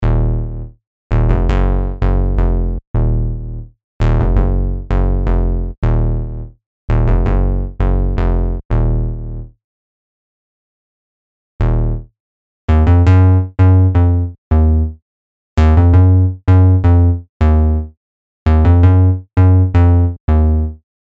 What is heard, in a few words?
Bass